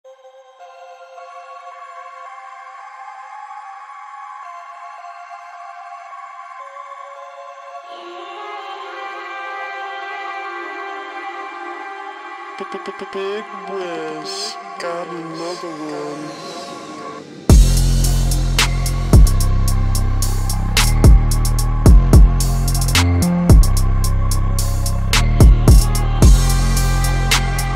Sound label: Instrumental